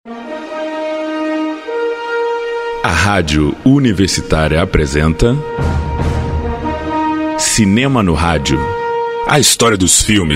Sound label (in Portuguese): vinheta de abertura; cinema no rádio